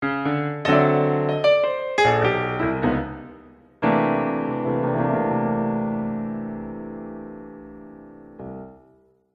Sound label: jazz, jingle